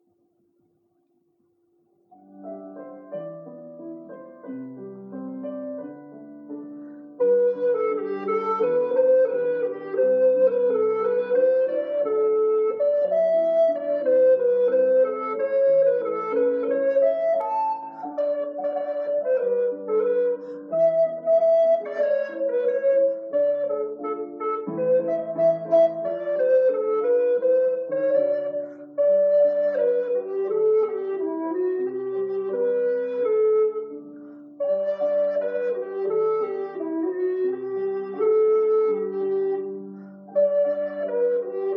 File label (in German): Tenorblockflöte mit Klavierbegleitung